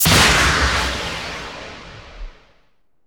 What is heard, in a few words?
SE GAME SLIDE; maimai